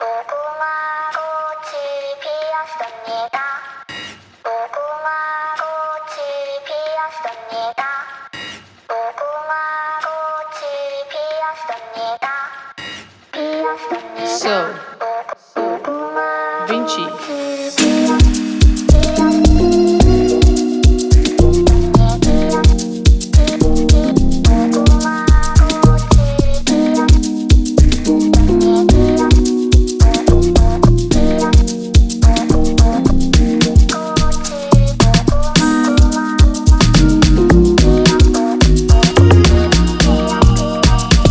Amapiano Remix; Squid Game

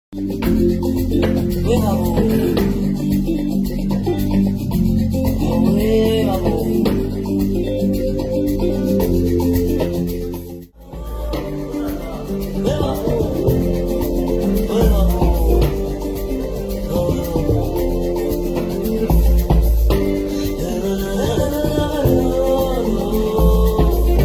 Amapiano On Maskandi

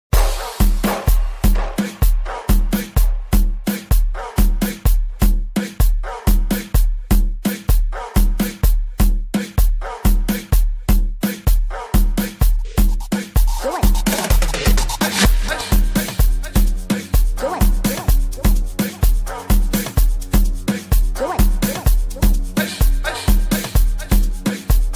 Instrumental

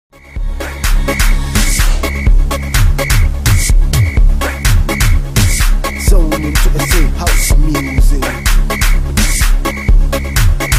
GQOM

